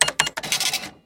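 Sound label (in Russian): работа кассового аппарата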